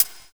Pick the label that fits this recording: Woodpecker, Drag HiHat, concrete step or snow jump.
Drag HiHat